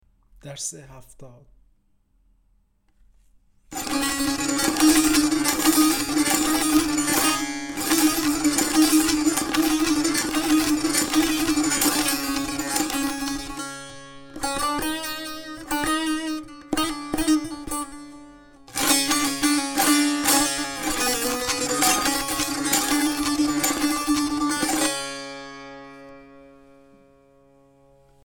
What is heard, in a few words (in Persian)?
مشق تنبور